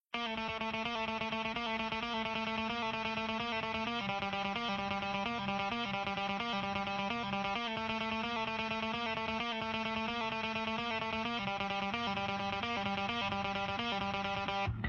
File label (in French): Guitare; Assassin